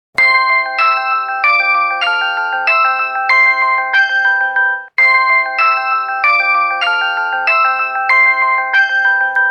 staryj rington samsung

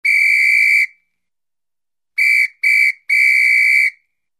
svistok policejskogo

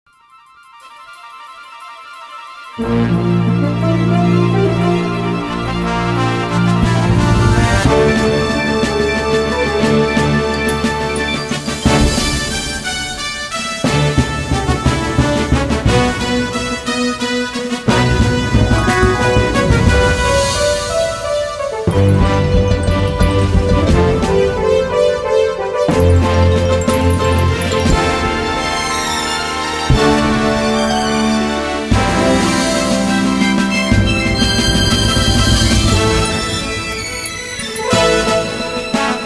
Fanfary Novogodnie

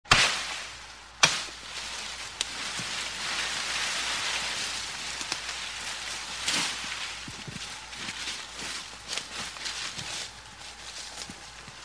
rubka drov v lesu

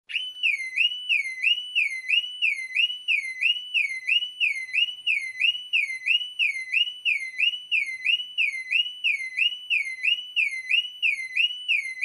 avtomobilnaja signalizacija

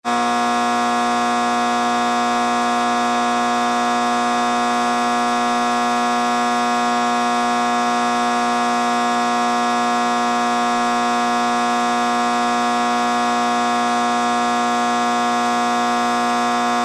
zhuzhzhanie transformatora

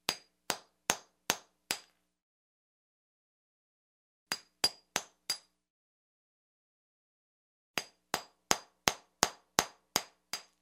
molotok i zubilo

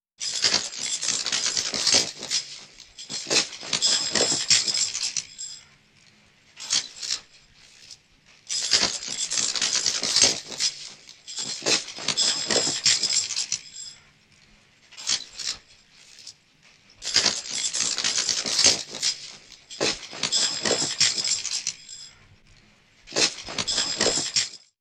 zvon cepej

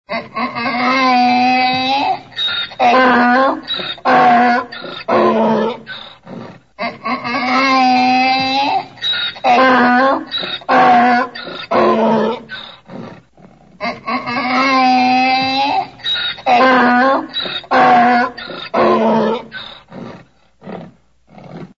zvuki osla

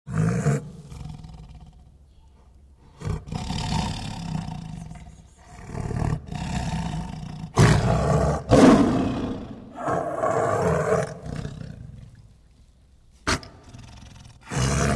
zvuki rychanija tigra

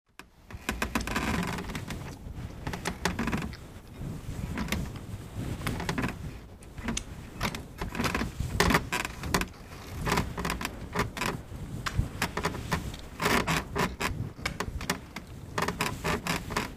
zvuk skripa krovati

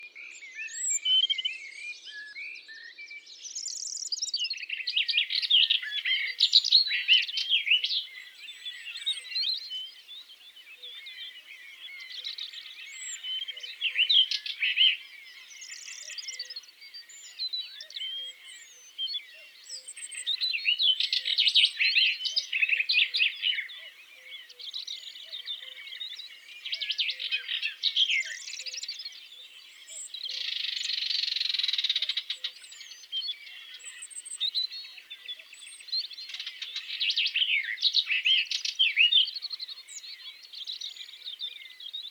jastrebinaja slavka

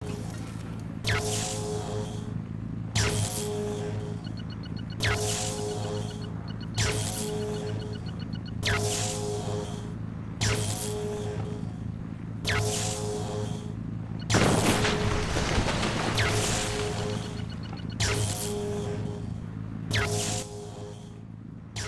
zvuki pushki tesla

